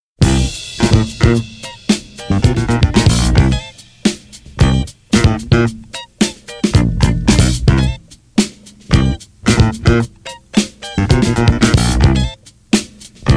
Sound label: korean soul